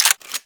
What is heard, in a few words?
Hand Shotgun 2D Mech B; Warframe; Tenno